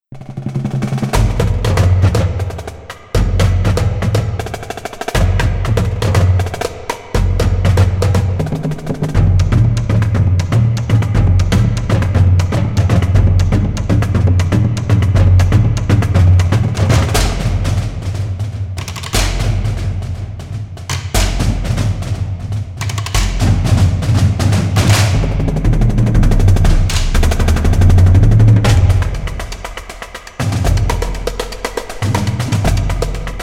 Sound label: drum corps; tonehammer